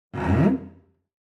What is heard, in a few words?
Cello Slide Up; SoundsCrate